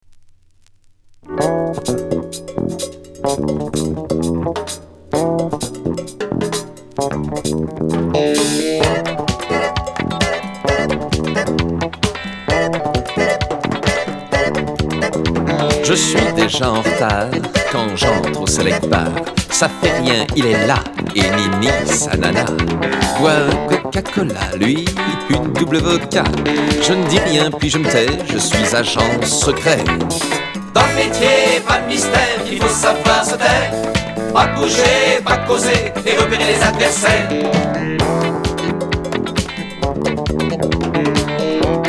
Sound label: french boogie